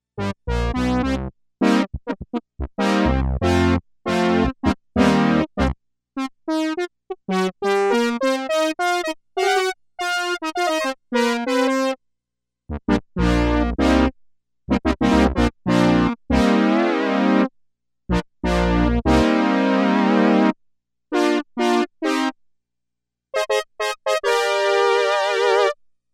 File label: Trumpet Ensemble